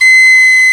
P WHISTLE, Old World Instruments, Winds; E-MU Producer Series Vol. 7 – Old World Instruments

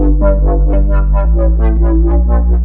Crazy Bass 174, breakcore breaks

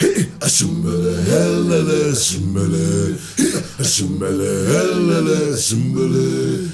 ASUMEBELE, CHOIRS; Spectrasonics; Heart of Africa 2

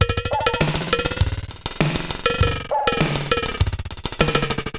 Digital Delay RX17 (100BPM), cyborg beats

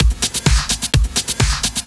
Clubhouse Loop 128 BPM

